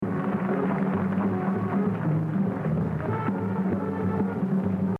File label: Exercise 7, Jazz Drumming - 100%; Gene Krupa; The Evolution of Jazz Drumming (Gottlieb)